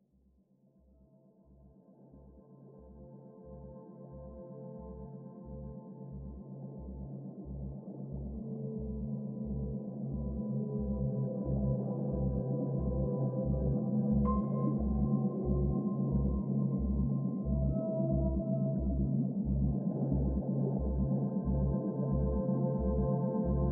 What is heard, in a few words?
Underwater Peaceful Whales